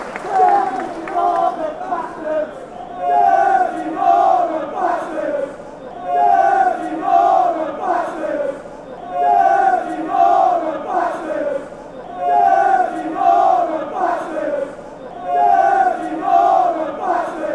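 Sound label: dirty northern bastards, fanchants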